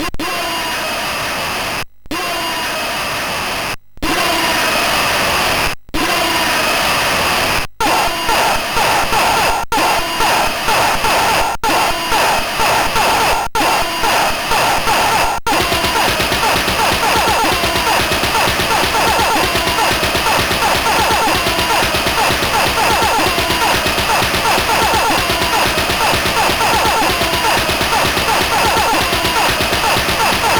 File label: Sheeps in Space II, MOS6581R2